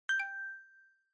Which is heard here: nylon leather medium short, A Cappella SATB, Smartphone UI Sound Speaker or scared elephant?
Smartphone UI Sound Speaker